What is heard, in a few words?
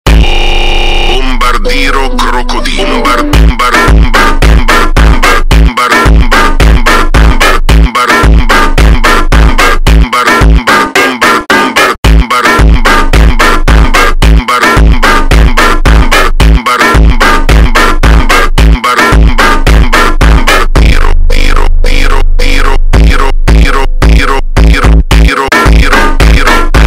FUNK